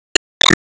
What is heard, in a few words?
Penalty Damage; disney star smash android ios mobile gamerip 2020